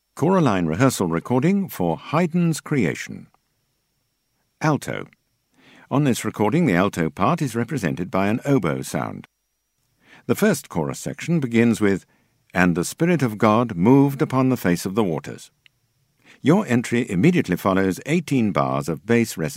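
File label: Alt, alto; Haydn Creation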